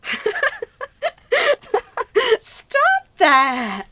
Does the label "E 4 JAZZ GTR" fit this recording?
No